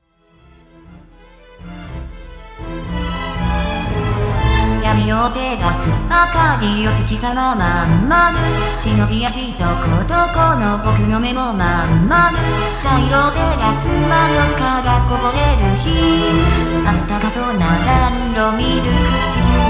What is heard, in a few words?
violin